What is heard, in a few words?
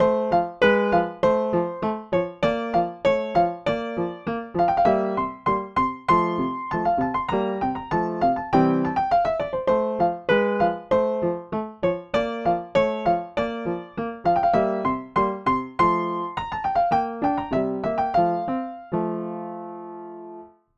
Open Lid Narrow Stereo Streicher